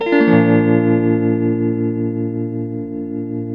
TELTMUMAJ B, TELE CHORDS, Guitars; Spectrasonics; Hans Zimmer Guitars VOL-1

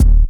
BD, Dance Mega Drums, HIP

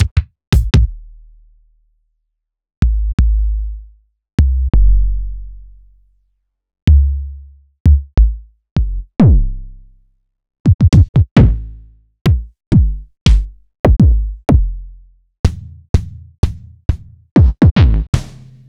Roland BK-5 Kicks

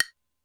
Hi Block, Alesis D4; Drum Machines

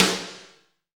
SNR FUNK, Snares; Roland - Rhythm Section; Snares 4; Sn Modules 4

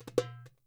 DUM ROL, Percussion; Sampleheads; NYC Percussionworks; ST PERC VOL1

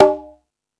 Latin Drums, E-MU Pro-Cussion

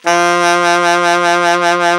ALT SAX, D3; Best Service; Alpine Volksmusik

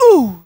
"OOOHH", VOCAL

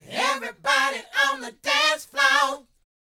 LET, Vocal, R&B Dance, DANCE 120

